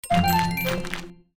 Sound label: Clover Collect; goldenClover